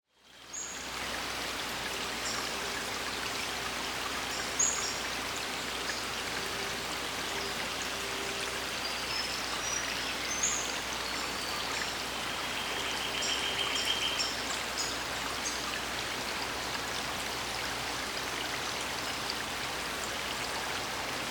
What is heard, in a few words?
nature forest ambience stream birds distant traffic